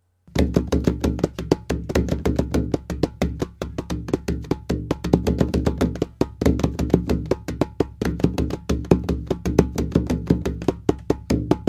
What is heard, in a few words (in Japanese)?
低音太鼓パターン; africa; 太鼓合奏曲